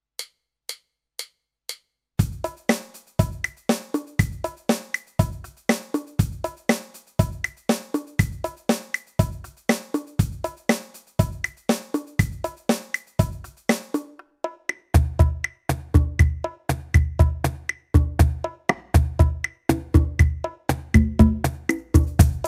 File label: Boss DR 01S